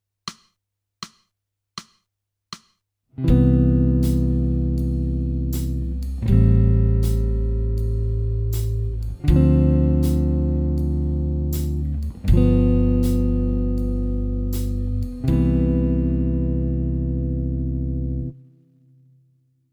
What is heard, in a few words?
jazz